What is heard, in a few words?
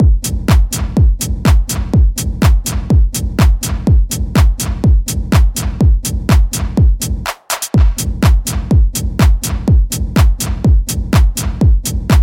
detroit techno loop